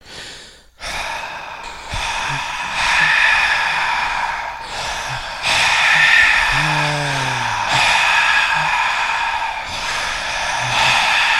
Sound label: sigh ringtone